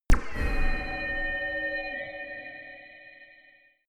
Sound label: chiming laser shot; soundpool